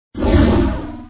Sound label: Sci-fi sound; Wario Gets Straight